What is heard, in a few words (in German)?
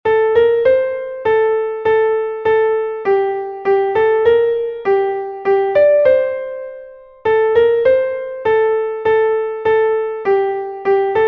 klavier; kommt ein vogel geflogen; melodiemeister